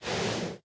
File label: breathe, horse; minecraft